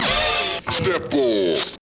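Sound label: Scratch Step Off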